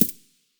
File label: aug silencer mono far, weapons; STALKER; IWP